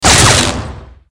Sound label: hit; travis